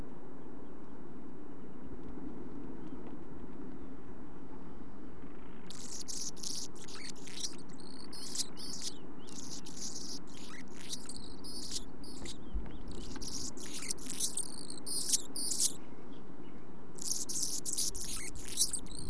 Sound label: Anna's humming bird